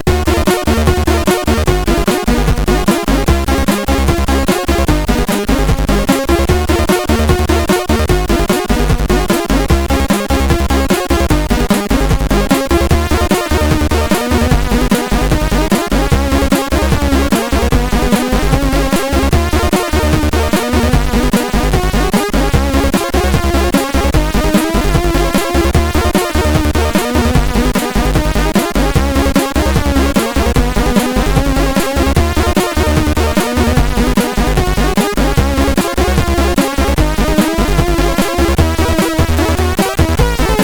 Success, CSG8580R5

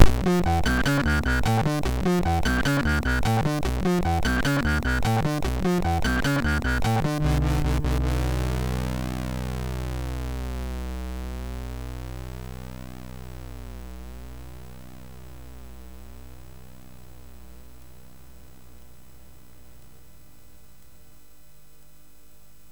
Fatamorcana intro sid MOS6581R3

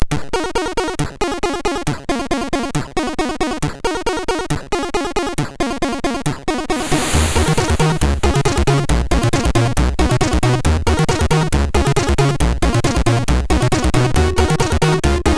Disco, MOS6581R3; sid; Disco DRAX

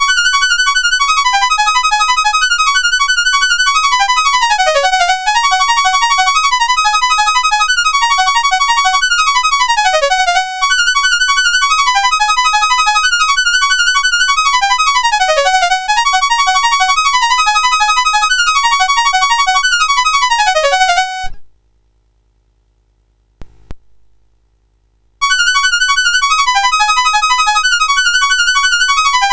Piano, CSG8580R5

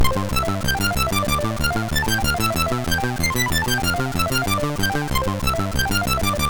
sid, MOS6581R3; New Zealand Story

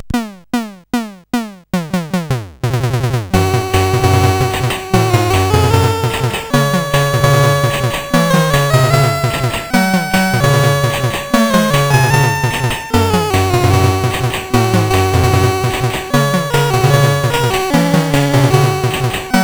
Death March sid CSG8580R5

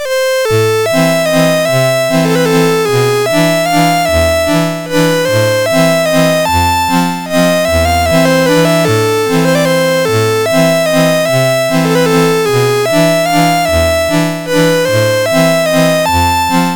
Shubert Waltz, MOS6581R2

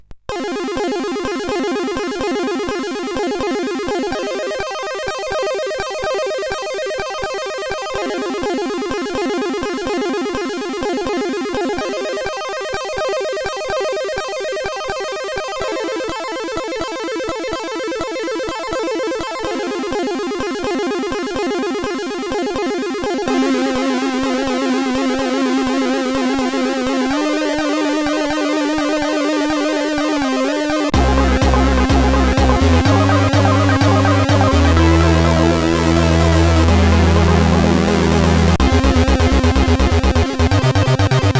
sid, CSG8580R5

